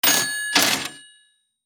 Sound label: Cash register bell cha ching purchase